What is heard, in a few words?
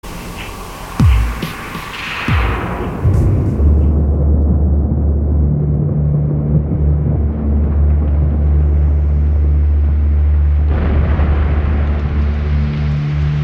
sad chillout mix